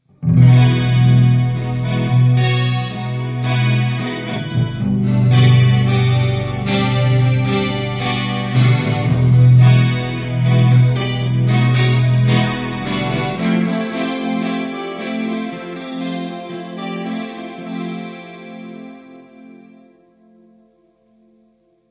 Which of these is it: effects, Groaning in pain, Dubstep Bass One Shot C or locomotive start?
effects